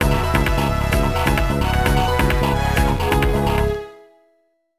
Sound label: BGM STAGE BATTLE; Phantasy Star Zero